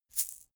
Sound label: shaker plastic egg hit single hard